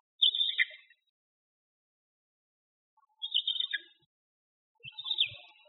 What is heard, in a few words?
newborn cries, owl